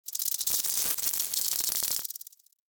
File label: Foliage Insect; Ambience; Props; Warframe; Duviri